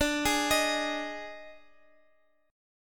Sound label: D sharp Major 7th Suspended 4th, D